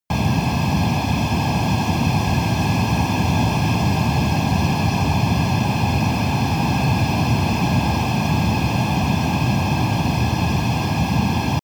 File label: military jet fighter turbine steady soft